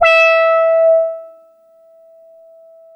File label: E6, Boom da Bass; E-MU Producer Series Vol. 6 – Analogia Project (CD 1)